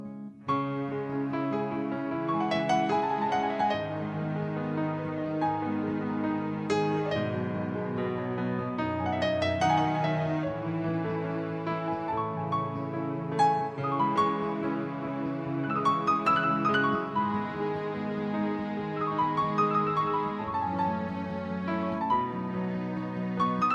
fine piano; classical

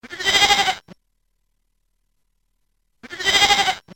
simple goat

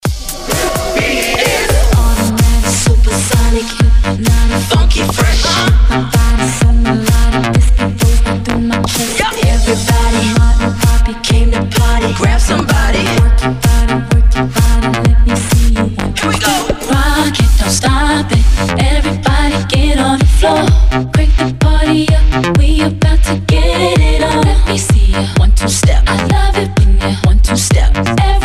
2 step remix

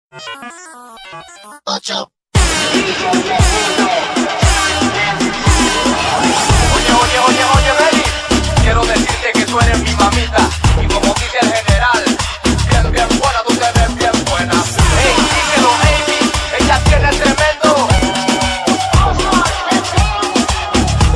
electro cumbia; ringtones; latino

